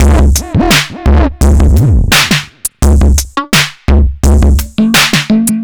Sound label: Beat Fuzz 85, distorted drum loops 85bpm; musicradar